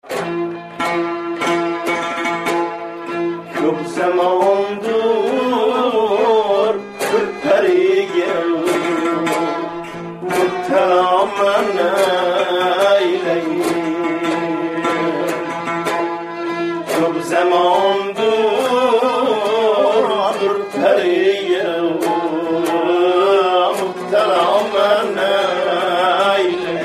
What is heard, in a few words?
Uzbek; Uchen Dur; Folkways